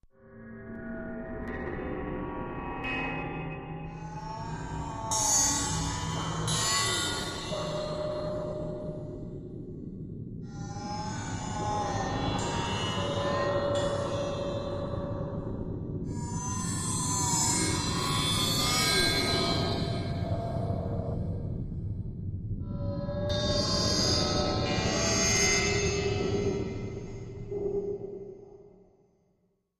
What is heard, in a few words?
Tin Star High Bending, metallic